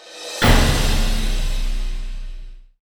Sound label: Level Up; The Deep Paths Labyrinth of Andokost